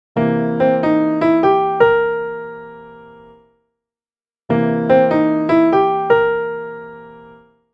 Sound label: blues notes c